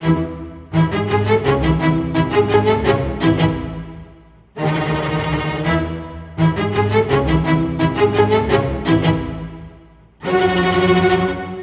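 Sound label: C# minor, Low Strings, 90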